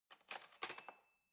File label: cutlery knife drawer grab